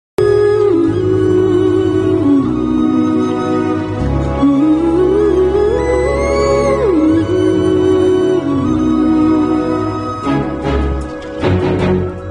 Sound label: emotional ringtone; Singapore Saloon; Kathir realizes reality